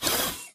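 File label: Tick Movement Servo Walk; Titanfall 2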